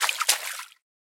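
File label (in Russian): легкий всплеск набегающей волны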